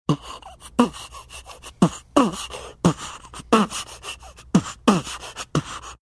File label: beatboxing